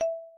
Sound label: save